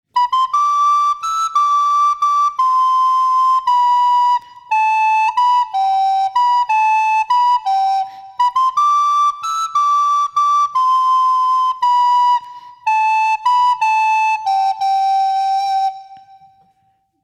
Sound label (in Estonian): plokkflööt